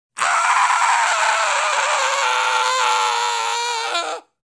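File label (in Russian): панический крик парня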